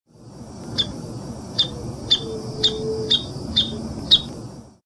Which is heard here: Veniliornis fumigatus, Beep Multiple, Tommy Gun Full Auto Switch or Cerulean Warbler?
Veniliornis fumigatus